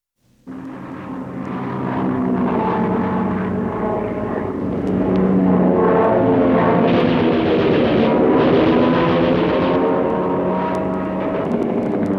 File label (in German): Luftkampf; Militaer